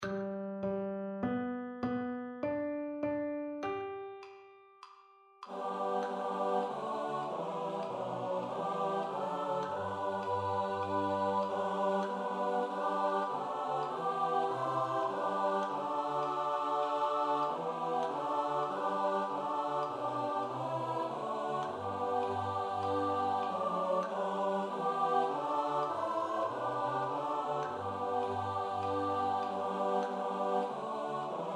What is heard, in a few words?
Im Marching Marching SATB STUDY